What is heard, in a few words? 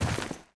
jump